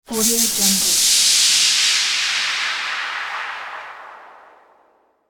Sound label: supernatural splash; sound ideas